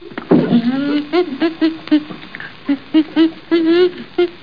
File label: Chimps